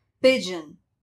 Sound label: pigeon; english; describing animals